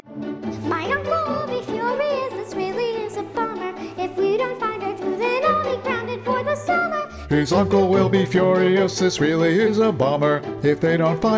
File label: bass; Hear bars 46-56 with just the bass part sung; 2019 Space Opera